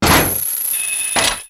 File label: Clawshot Fire